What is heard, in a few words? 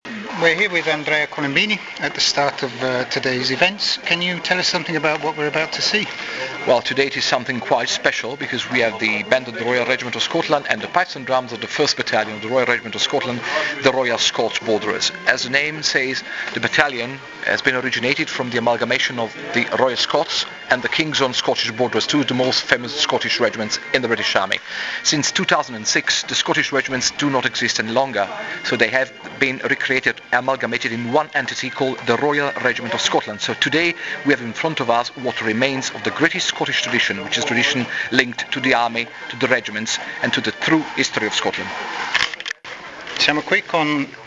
royal scots borderers